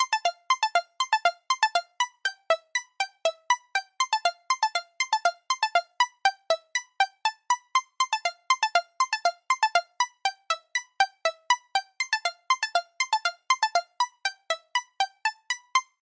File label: reminder; iphone 7